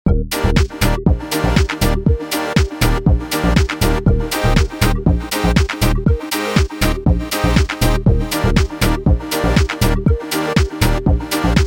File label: loop funny